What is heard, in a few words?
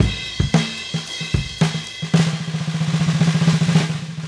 Raw Funk Drums Fill, 70s Analog Funk; Sonic Foundry (Sony Creative Software); What it Is!